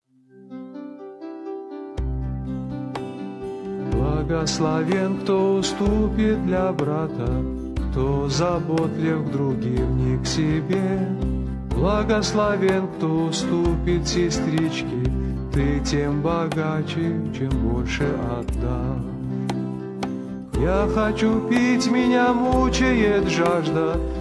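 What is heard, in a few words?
Song, Russian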